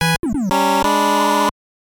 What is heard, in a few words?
Lose Life; Duck Tales